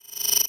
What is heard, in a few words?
Multimedia Internet CD-Rom Flash Hi-Tech